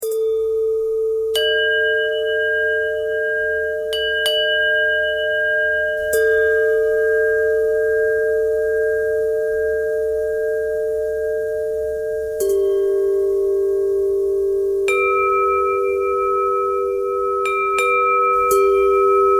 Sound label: bell bend threads; bells